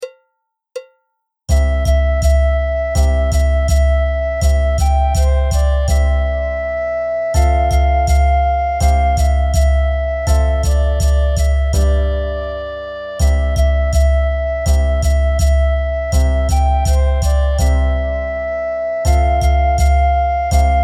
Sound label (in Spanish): navidad navidad con percusion